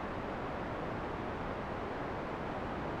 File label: 12dB, cutoff 6, res 0, jumpers 2, 5, Q150; Q150 slope jumpers